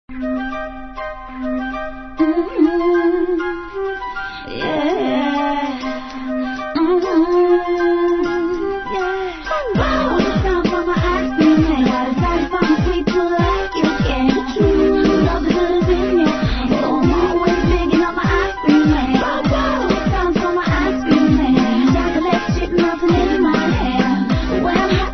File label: flutes riddim